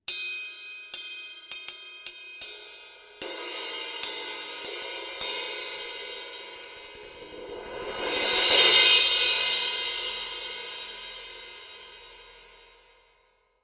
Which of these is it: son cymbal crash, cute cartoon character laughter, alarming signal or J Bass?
son cymbal crash